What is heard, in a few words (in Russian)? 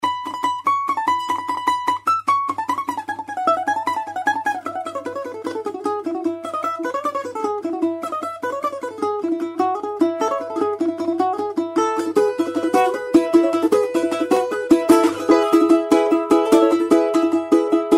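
балалайка барыня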